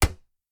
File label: sling metal, attack impact